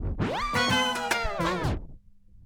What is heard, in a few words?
Turntablism; Sonokinetic - The Roots Drumloops